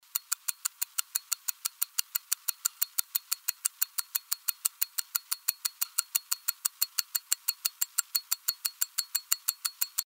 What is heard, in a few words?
21600 Omega 861